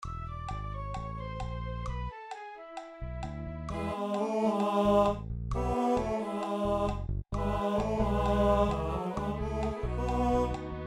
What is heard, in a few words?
bass